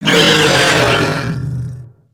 Scared Roar, creatures organic; Burno

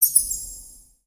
rats reverb; ambience ninjago

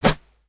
cannon jump up end

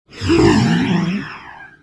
Idle, creatures organic

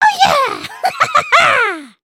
happy; emotes

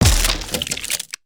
Wood Splinter; destructibles

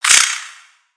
Spider Get Hit; Lego; aronwk collection; ndaudio; creatures mechanical

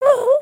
cheer; emotes

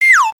Seagull Drop; creatures organic

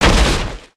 jump att impact; weapons

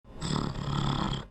Kitty Cat Purring; creatures organic; aronwk collection; ndaudio

